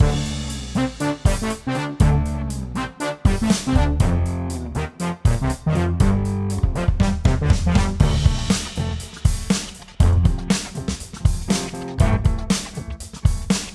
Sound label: Dance Club